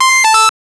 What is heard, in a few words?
money sound kill point